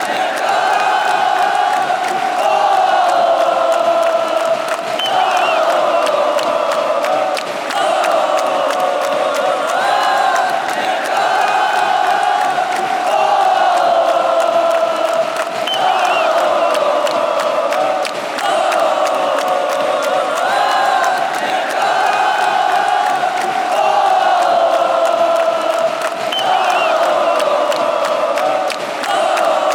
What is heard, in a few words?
ooh ooh ooh, chants